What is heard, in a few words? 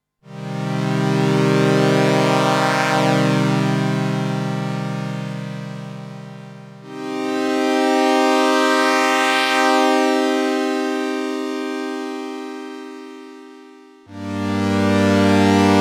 Juno 60 PWM Envelope